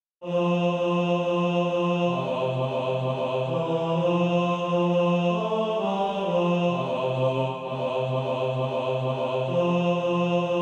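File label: bass, Practise Music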